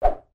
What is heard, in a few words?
standup; Fruit Jump